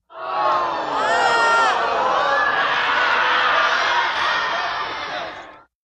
Theater crowd, horrified oooh, frightened

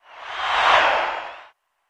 Football Crowd Dopp, sports arena football

